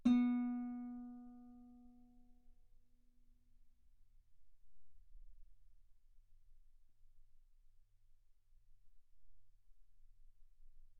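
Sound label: Guitar ff B string B3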